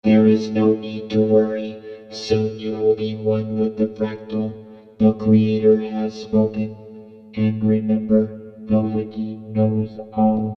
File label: vocoder test